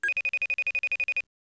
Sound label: ring inbound; sipclients